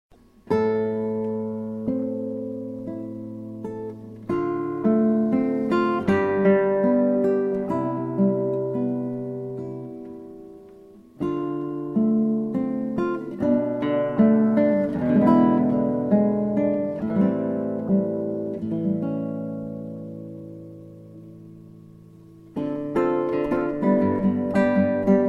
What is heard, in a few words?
bossa